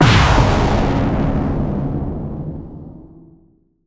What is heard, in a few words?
Impact Boom Distorted; bottlerocket